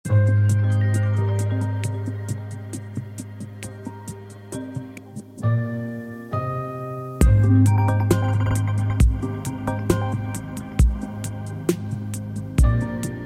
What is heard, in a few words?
Dreamer Encore Remix, iOS 26 ringtones; Apple’s iOS 26